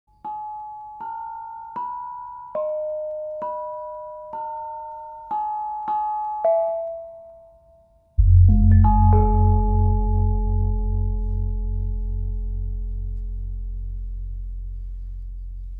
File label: bonang, gamelan